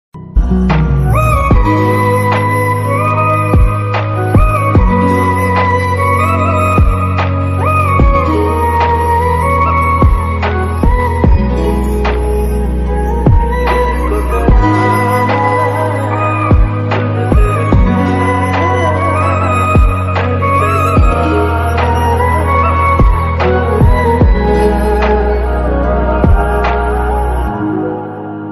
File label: flute ringtone sad bgm ringtone sad flute music ringtone